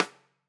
Rim

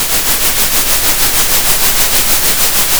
pop, Sound of a train

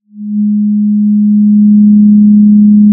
Sound of a cruise ship horn

